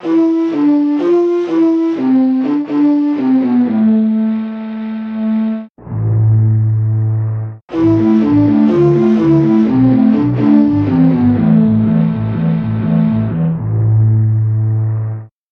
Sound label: shakuhachi